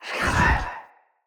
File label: Staff Caster Cast Long; Weapons; Staves; Wazzard Staff Caster